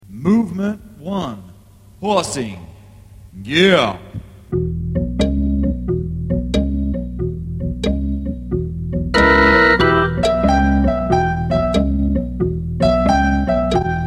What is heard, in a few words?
rock movement horsing audio sample; classroom music programs